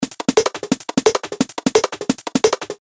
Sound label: Shuffle 174